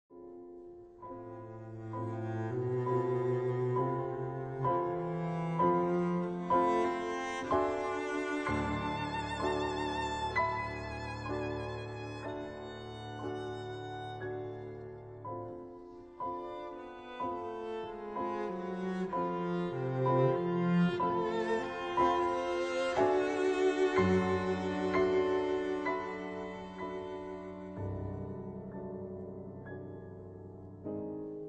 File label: trio, PIANO TRIO